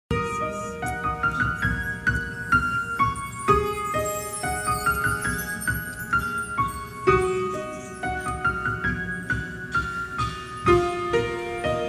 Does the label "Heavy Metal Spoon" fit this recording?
No